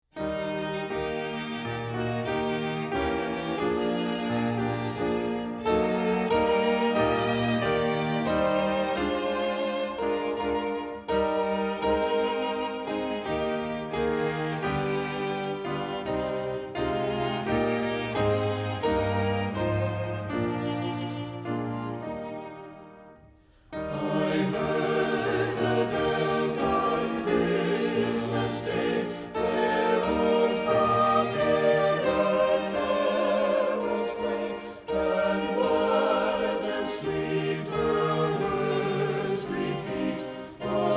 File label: i heard the bells on christmas day words and music